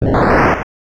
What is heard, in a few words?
enemy die; mother2